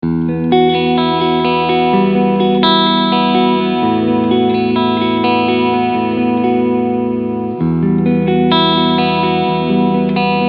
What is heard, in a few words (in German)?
ESP LTD M 400 SOLFD Cleansound beide PUs